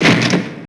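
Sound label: Door slam; PC Gamer IT CD 38; thief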